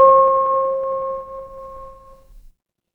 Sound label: instrument singing bowl